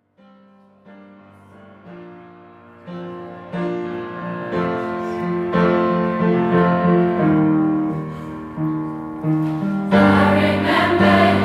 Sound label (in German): 4-stimmiger Kanon